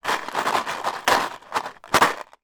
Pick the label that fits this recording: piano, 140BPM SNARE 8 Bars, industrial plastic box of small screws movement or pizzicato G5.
industrial plastic box of small screws movement